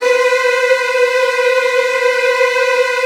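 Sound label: Virtuoso Strings, violin trills, B2; ILIO; Kirk Hunter Virtuoso Strings VOL-1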